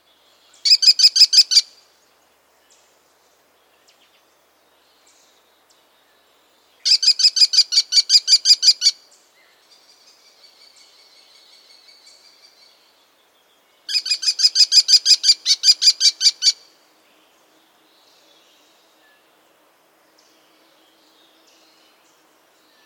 American Kestrel

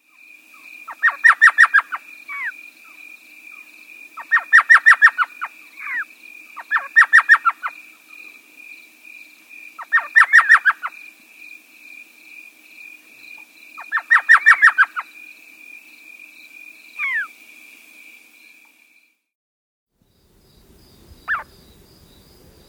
Elf Owl

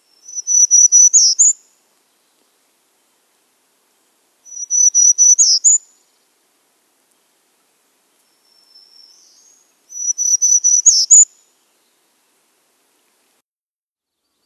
Townsends Warbler

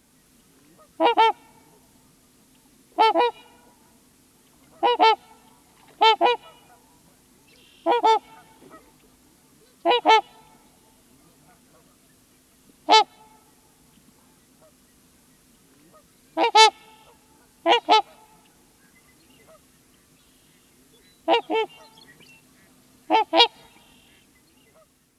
Trumpeter Swan

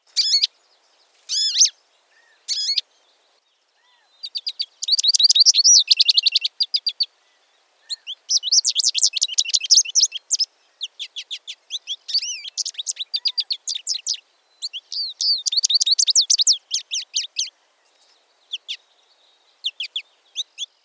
American Goldfinch

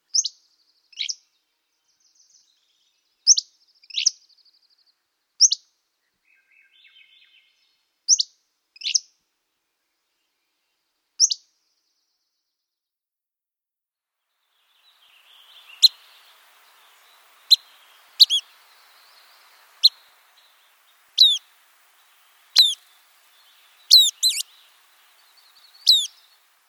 Hammonds Flycatcher

